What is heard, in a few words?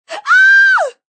Scream Female, Female scream, high pitched, short, surprised; human voice; Screaming; VoiceBox